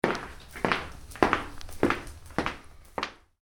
Football boots walk, Soccer Boots (Metal Studs): Walking On Concrete; Sports; Stuart Duffield